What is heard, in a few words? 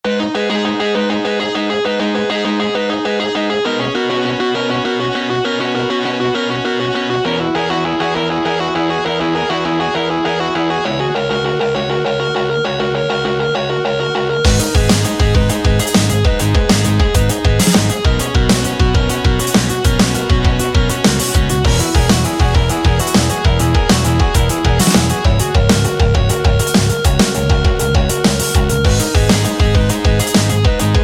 Drum Sounds